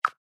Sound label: button Click